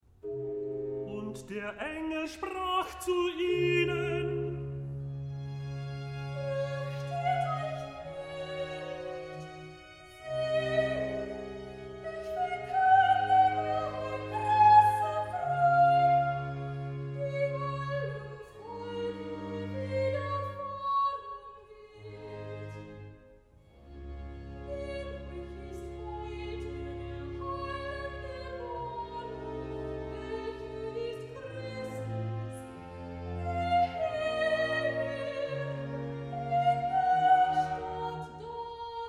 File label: Recitativo; BWV 248, Kantate 2, Am zweiten Weihnachtsfeiertage